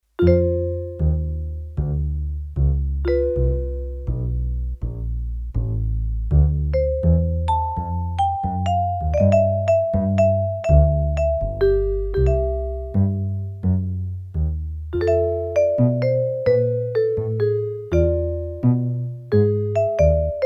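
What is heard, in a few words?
Acoustic Bass & Vibe, Roland D-10; Factory Patches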